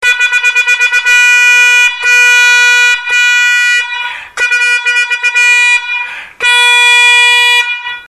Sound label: horn